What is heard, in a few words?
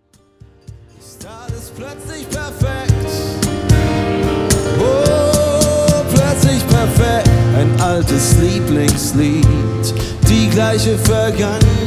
Piano, acoustic band; Schloss Ummendorf